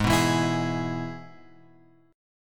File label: G sharp Minor Sharp 5th; G#m#5 Chord {4 2 2 X 5 4} strummed